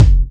zapp, Kicks Electro

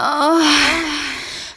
female orgasm

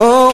"ooh" SUNG

